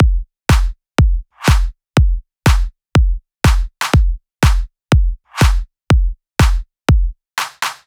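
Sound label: Deep House Kit 122bpm Stripped, Drums; EDM Drums; Drum Kits; Stems; Ghosthack; Ultimate EDM Drums; Deep House Kit 10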